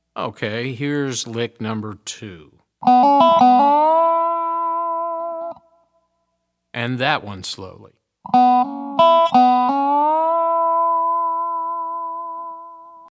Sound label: resonator pedal, steel guitar